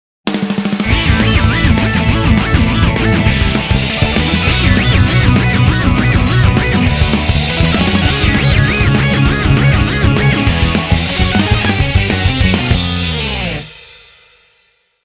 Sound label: JINGLE SPEED UP; heroes